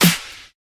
wet clap sample f key, percussion; single hits one shots

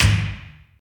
short reverb hand clap sound g sharp key

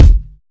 kick drum single shot f key; drum single hits one shots; kick drum samples

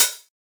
damped hat sound b key; hat samples

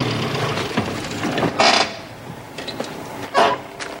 OLD HANDBRAKE, car

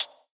quiet hihat sample a sharp key

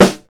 boom snare sample G key

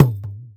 acoustic tom one shot g sharp key

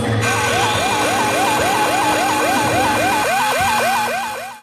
wailing alarm

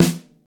tight low end acoustic snare sample E key

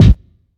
large room kickdrum sound c sharp key

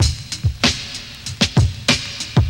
shiny steel snare drum D# key

